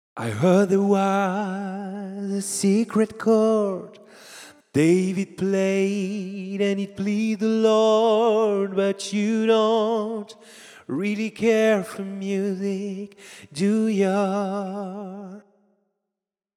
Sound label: acappella, gospel